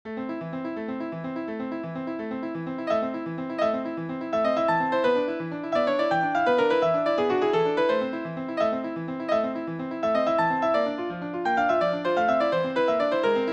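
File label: Tarantella, piano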